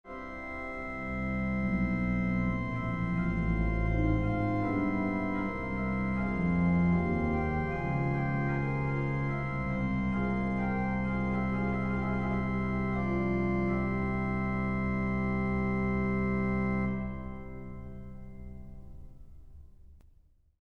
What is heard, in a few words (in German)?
streicher, orgel